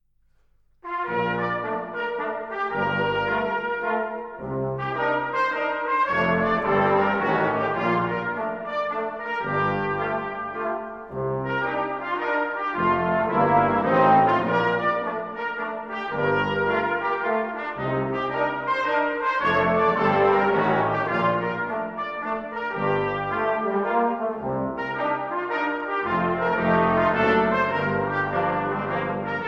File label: Jazz Waltz